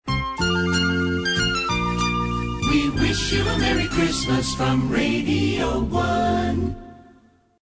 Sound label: jingle merry xmas